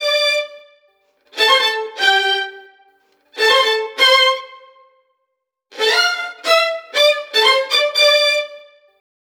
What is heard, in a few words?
STRING LICKS, Disco